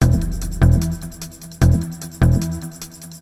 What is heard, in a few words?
question music